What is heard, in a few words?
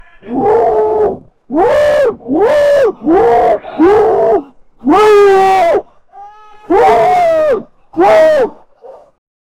angry lion shouting